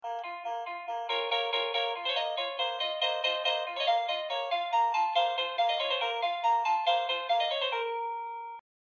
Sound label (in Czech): melodie